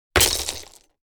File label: Rocks and various debris thrown on bricks and asphalt